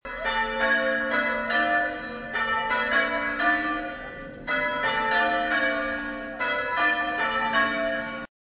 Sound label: bells; st james finchampstead